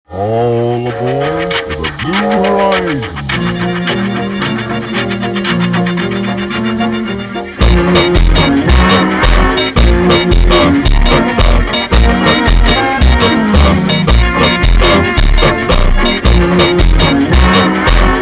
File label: Funk Super Slowed